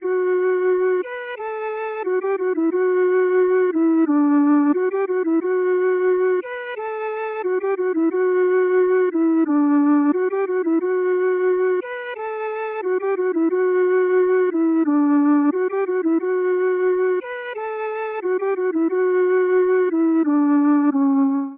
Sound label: flute; Zomboid; EntertainYourself